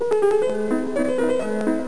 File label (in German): klavier; winpolis